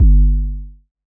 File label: electronic kick tone; soundscrate